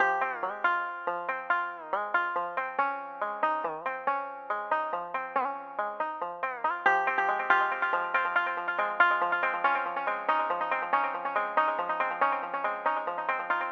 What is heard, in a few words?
banjo; Zomboid; EntertainYourself